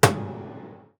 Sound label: IR piano; OM-SoX hoaencode